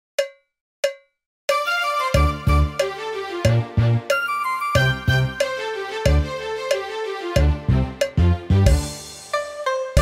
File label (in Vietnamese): ly cay bong beat karaoke